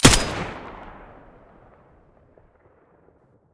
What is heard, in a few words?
M4A1 muzzle stereo